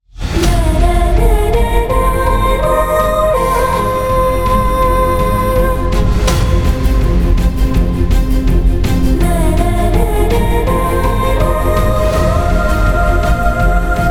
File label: Climax Emotional BGM; Mr. Bachchan